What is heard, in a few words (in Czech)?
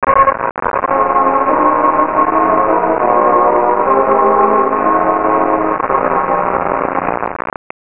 Radio Musik